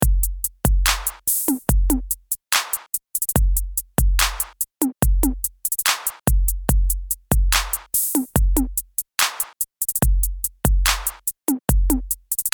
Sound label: Trap Beat Drums